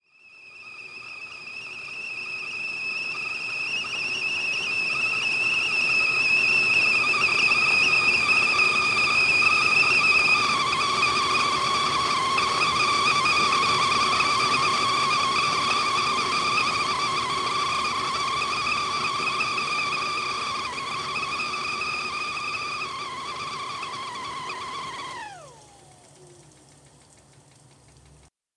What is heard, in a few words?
kettle